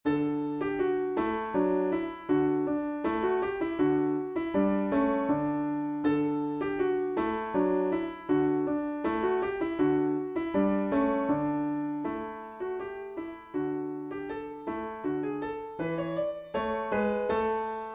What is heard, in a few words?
Deck the Halls